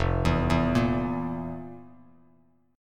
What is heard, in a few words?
F Major 7th Suspended 4th F, Bass Standard; FM7sus4 arpeggiated; JGuitar